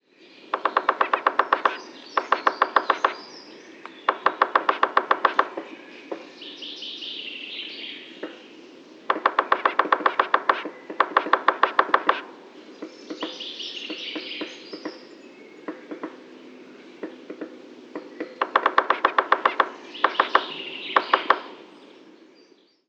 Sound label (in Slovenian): triprsti detel picoides tridactylus trkanje; zvoki zivali; pohorka